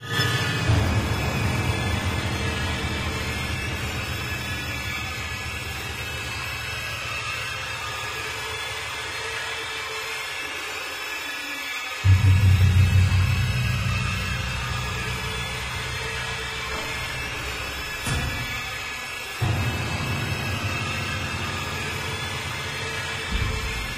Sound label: scary horror; chungo